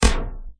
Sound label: Underwater Clam Close; Toots